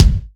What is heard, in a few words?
acoustic kick drum g key